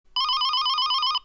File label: Ring